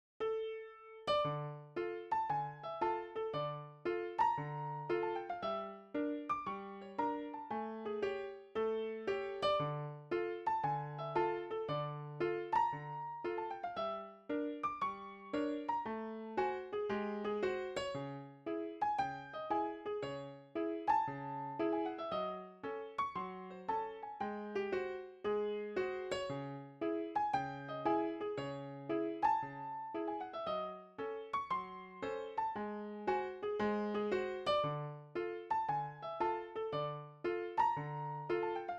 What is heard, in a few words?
Piano Bar; Indiana Jones and the Last Crusade; reworked MIDI soundtrack; Zeppelin